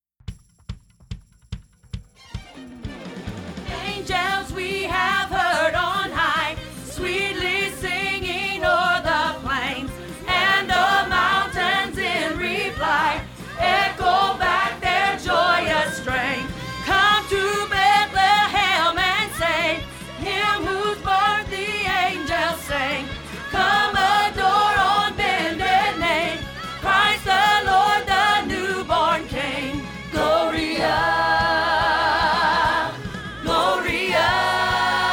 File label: Gloria Reprise Alto; Christmas 2015